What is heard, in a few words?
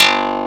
Klav 2 G1; Mo' Phatt; Digital Sound Factory Mo' Phatt